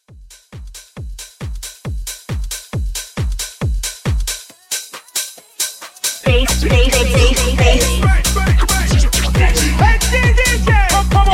Bounce Hype Mashup, 136

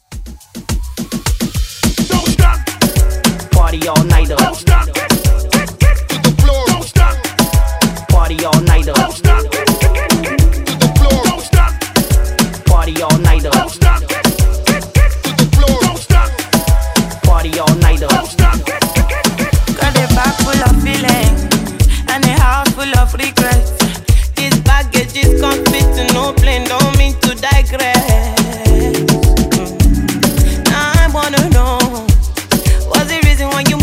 Moombah Afro Hype Redrum

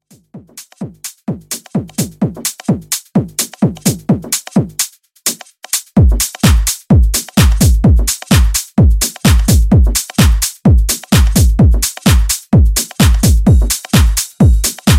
Bigroom Edit 128 Bpm

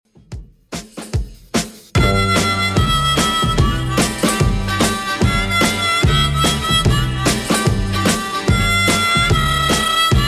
60s 147bpm; Redrum

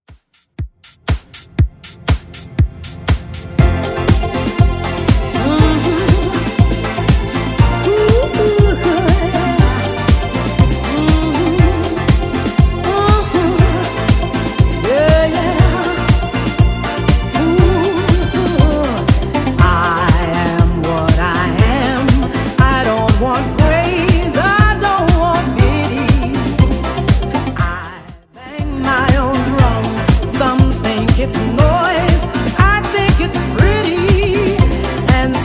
80s Redrum, 120 bpm

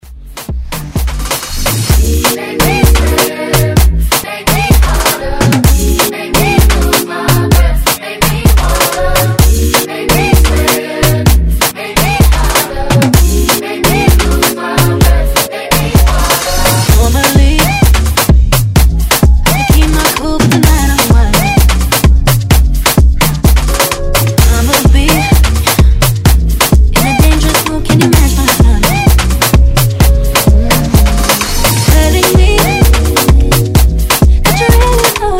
Afrobeat Redrum

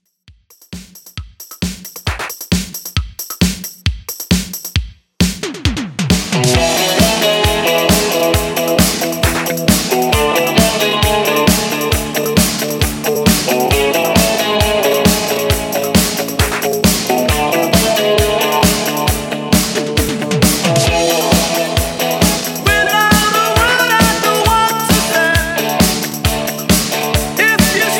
80s Redrum 134Bpm

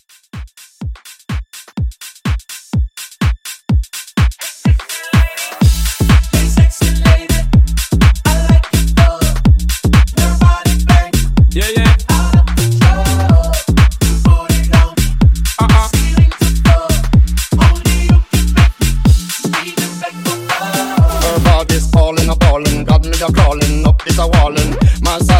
House Mashup 125; remixplanet

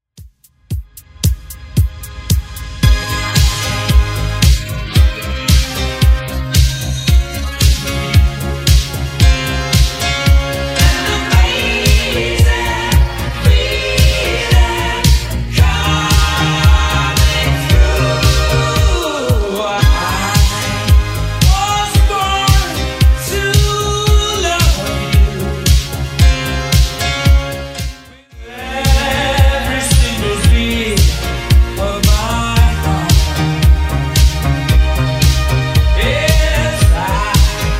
80s Redrum 113 bpm

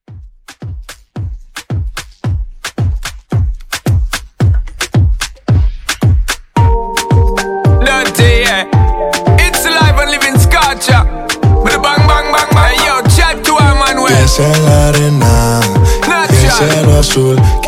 Moomba Club Mixshow 111 CLEAN

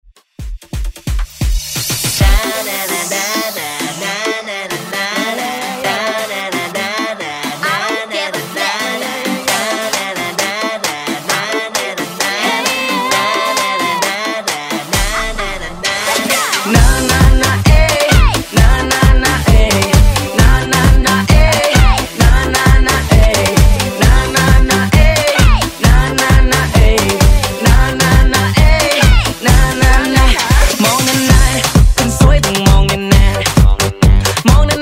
House Redrum

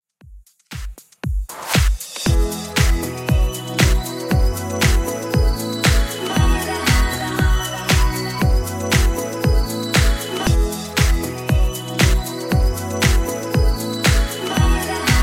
117 Bpm; Dj Intro Outro Clean

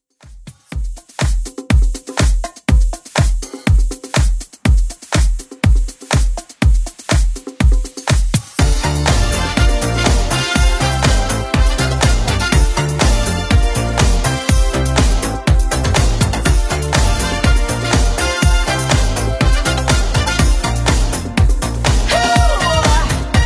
80s Club Edit 122bpm

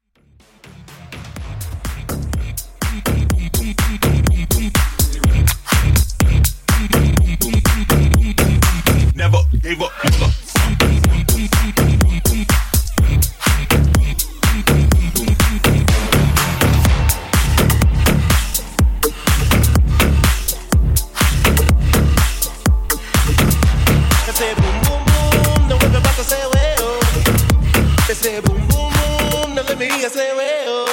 124 129Bpm

